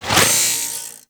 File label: Worker Grinder Whoosh; Warframe; Grineer